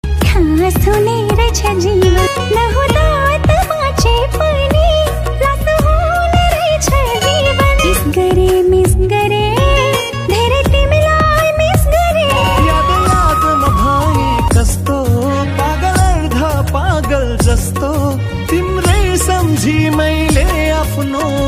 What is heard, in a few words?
nepali sweet love ringtone